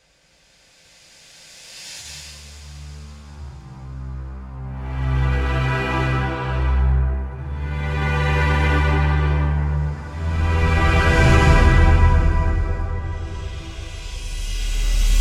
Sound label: choir